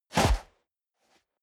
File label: step boots sand jump